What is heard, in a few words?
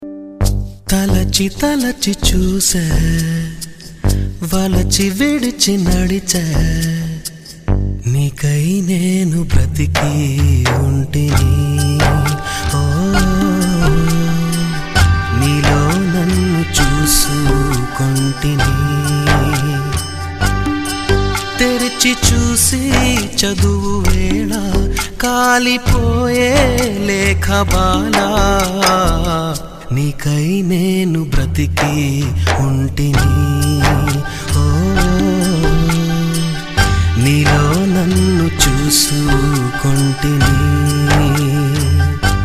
Male Version Telugu Ringtone